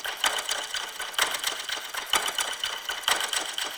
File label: Last Portal Open Clock Loop; Warframe; Duviri